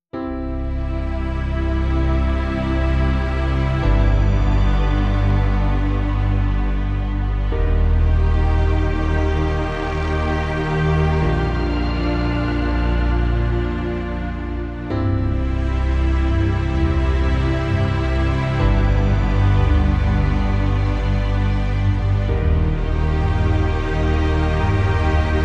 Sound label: No Pling Synth